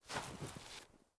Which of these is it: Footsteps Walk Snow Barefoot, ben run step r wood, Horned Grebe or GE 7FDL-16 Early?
Footsteps Walk Snow Barefoot